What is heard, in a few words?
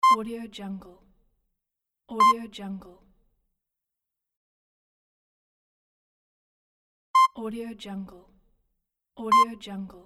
life support machine